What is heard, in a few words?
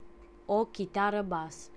guitar electric bass; tuxpaint-stamps; music; string